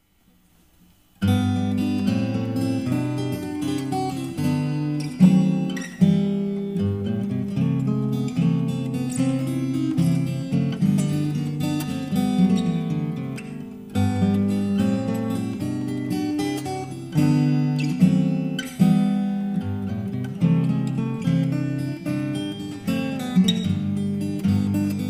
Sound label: Duet In G